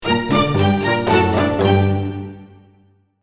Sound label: String ensemble descending riff